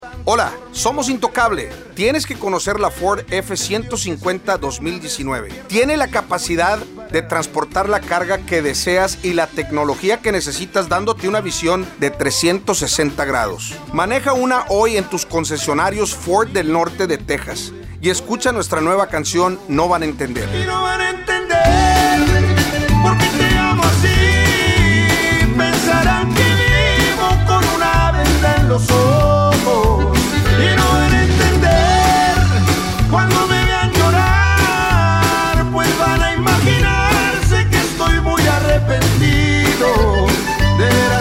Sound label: Hispanic; Ford NTX; Broadcast